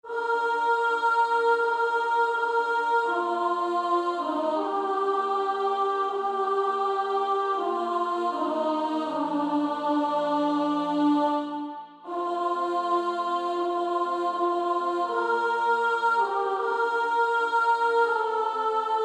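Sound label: Female Choir, soprano 2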